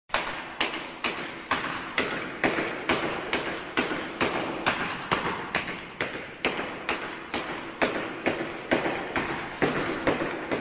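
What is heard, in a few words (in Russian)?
ковер выбивают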